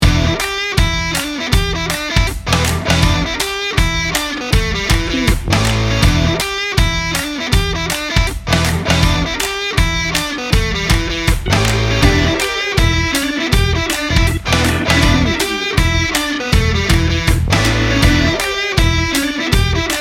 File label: beer party